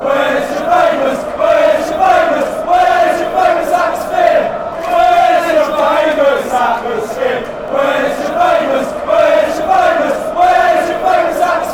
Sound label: wheres your famous atmosphere, chant; watford